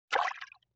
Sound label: Water Splash Small; Vintage Cartoon; Foley